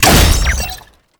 Scan Impact; Frumentarius

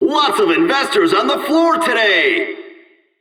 Crowd; Sark; Warframe; The Index

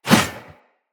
Sphere Sword Whoosh; Weapons; Swords; Sphere Sword And Board

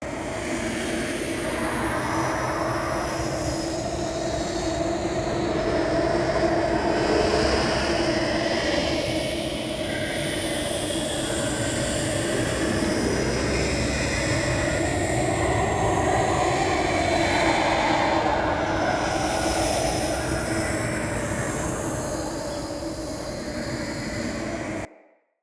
Root Cave Bed Loop, Ambience; Neathuns Root Cave

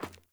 Sandals Run Metal; Player; Footsteps

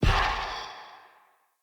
Turn To Stone Bird Destroy; Powers; Fey

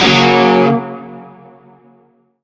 Shawzin Yo Chord I; Warframe; Narmer Shawzin

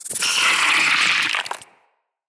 root spider vocal attack; Animals; Spiders; Vocals

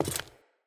Wood Step; Characters; Ambient; Sprout Farmers

